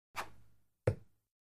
Darts; Dart Throw With Feather Whoosh. Hits Target.